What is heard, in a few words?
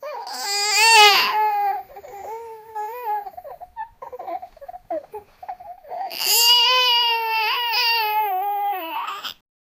small baby crying